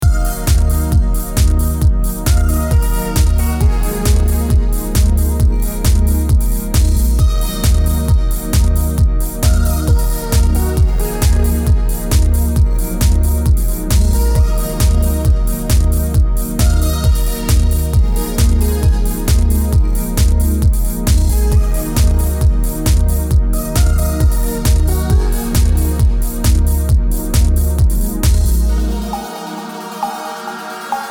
Lounge (Dance Electronic)

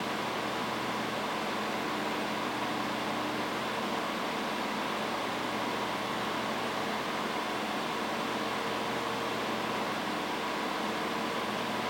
fan Low; PC Building Simulator

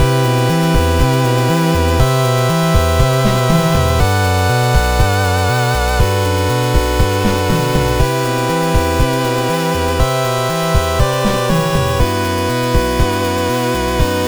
Menu Loop; Alwa's Legacy

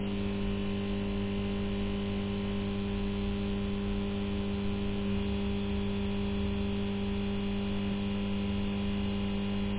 Buzz light; Five Nights at Freddy's The First Generation

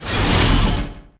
Interface

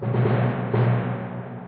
Tymp

